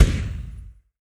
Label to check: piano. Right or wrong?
Wrong